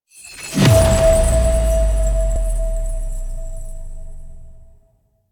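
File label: Investigate Diorama Finished; Warframe; Cephalon Weave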